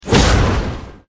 effect cut

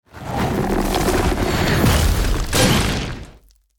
attack; hero sound

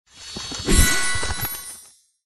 attack; hero sound

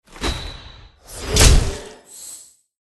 attack; hero

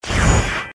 sprite skill

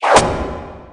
skill star, battle

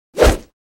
stick

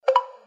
close

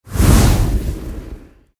large fireball

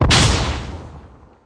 Windshoot Boom; franky

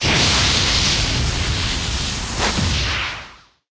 Fire kick Ultra; sanji; battle

